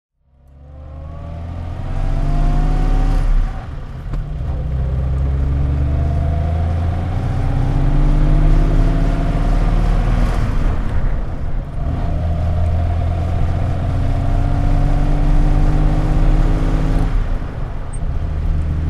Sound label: jaguar mkIV 25 saloon driving